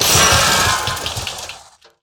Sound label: Kaboombot Death